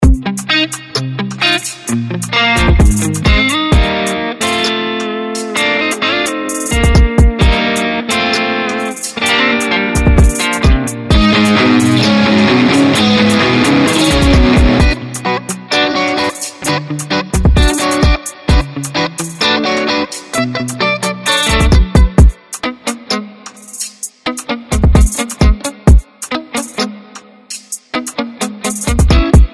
Trap Guitars

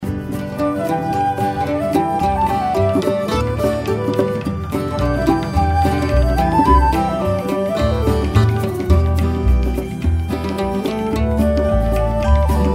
Irish Folk

